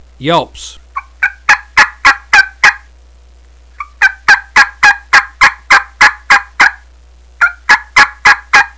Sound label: cuttin hook yelps; calls mouth double; primos